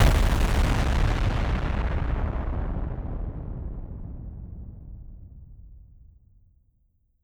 explosion large

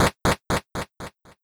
scene transition footstep lowbit slow

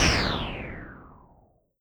laser gun

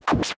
sword slash fast

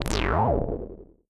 slime monster

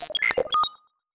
computer beep

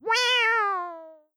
cat meow synth sadly

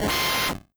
laser gun lowbit like; like saga2

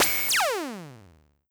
laser gun

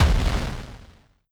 explosion

